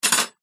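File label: Silverware Drop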